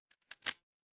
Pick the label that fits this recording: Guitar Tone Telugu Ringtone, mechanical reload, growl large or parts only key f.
mechanical reload